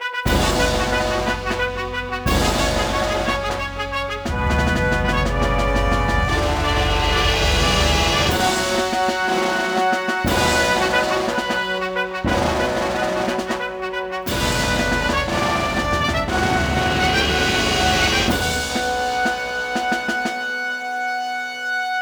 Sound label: Victory; starship troopers terran ascendancy; windows